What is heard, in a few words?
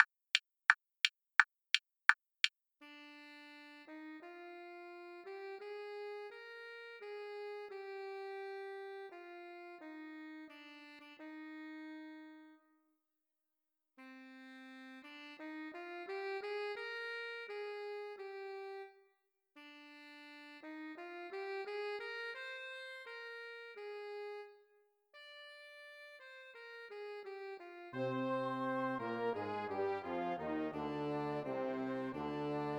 saxophone slow